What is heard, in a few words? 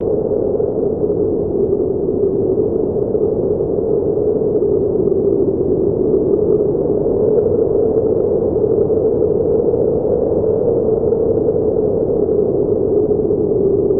Strong Wind; ambience pet rock